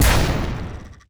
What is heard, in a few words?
Cuckoo Clock Cocktail Explode; Nova Sound